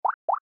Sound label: bip bop